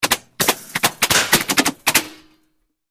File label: Bullet Impacts Metal